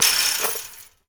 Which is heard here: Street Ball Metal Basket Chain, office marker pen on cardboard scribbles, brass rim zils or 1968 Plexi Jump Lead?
Street Ball Metal Basket Chain